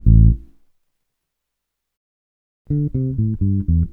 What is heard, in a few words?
WALT 122 A, Bass Grooves; USB Soundscan vol.30; AKAI